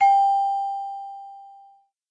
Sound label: ding